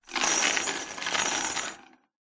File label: industrial chain medium sized lay down coil on external wood floor